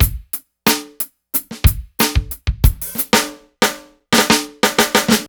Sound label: Dry Funk Kit fill, Drums Beats